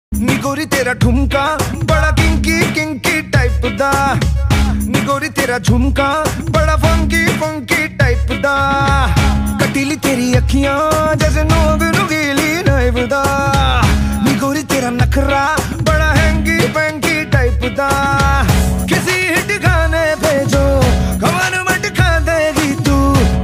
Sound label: Ringtone